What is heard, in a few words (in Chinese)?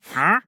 待机声; 流浪商人